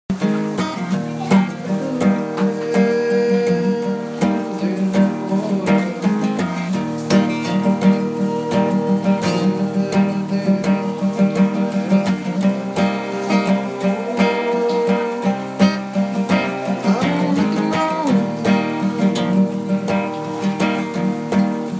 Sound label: with vox ideas